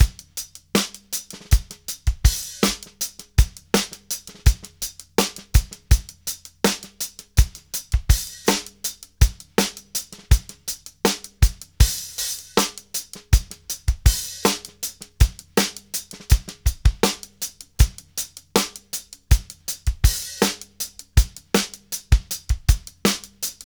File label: funky drums; AMG; Now CD-ROM (Roland); NOW from HELL!